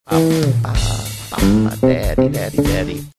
singing along with funky bass saying daddy daddy daddy